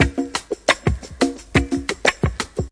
088 freaky wacka, DRUM LOOPS and BREAKS

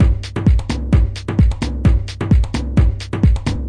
brazil house, drum loops and breaks, 130 bpm

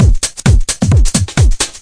132 bpm jumpy house, drum loop

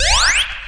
video glide, electronic hits

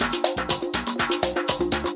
122 bpm thin filter latin, drum loop

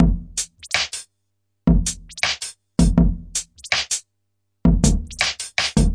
080 minimal conga breakbeat; 000 to 080 bpm

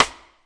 RIM, UK Hard Kit; Hip Hop; STYLE KITS (Hip Hop, Etc)

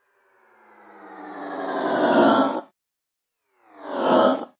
going down, sweeps; Ze Franks Pain Pack; sweeps and fx

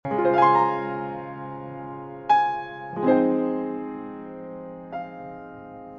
chill piano 80 Db ma13 Fm9; KEYS ORGAN PIANO

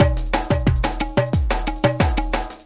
180 bpm doubletime ethno, drum loop

